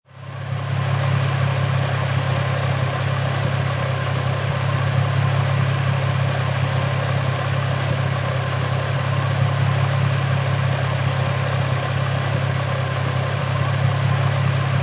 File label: Infinity G35 Engine Idle, High Performance Car; Auto, Power Car; Engine perspective. Idle, Idling, Ticking over